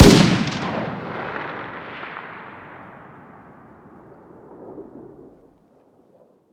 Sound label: fire dist 308 rif ext; gmod; arccw uc commons attachments